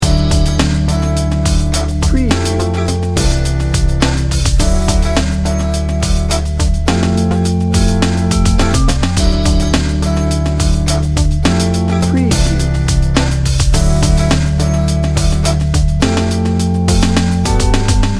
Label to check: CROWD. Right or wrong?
Wrong